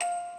musicbox

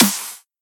snare effect

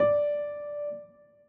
D o4 Long

